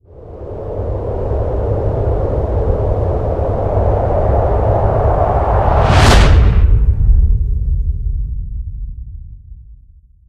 carousel active, anomaly; STALKER; somber anomaly

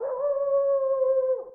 ambient dogs; STALKER

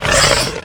chimera agroed howl, mutants; STALKER; customnpcs

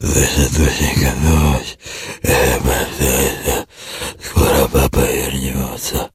mzombie idle; STALKER; customnpcs

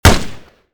m4a1 fire; STALKER

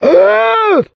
zombie enemy; STALKER; customnpcs

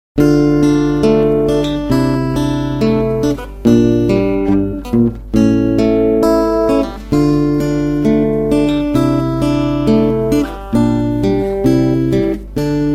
guitar; STALKER

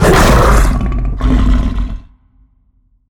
chimera agroed growl; mutants; STALKER; customnpcs

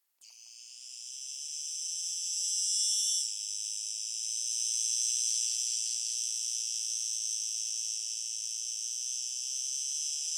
ambient cricket; STALKER

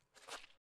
mrat make hit; STALKER; customnpcs

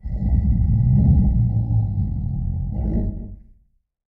ambient mutant; STALKER

